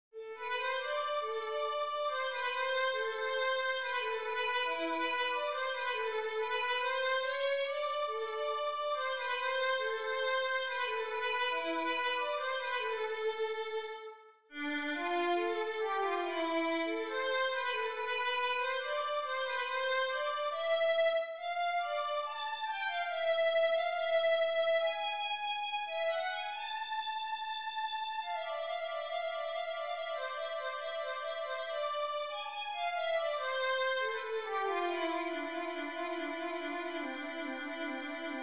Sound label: basso violin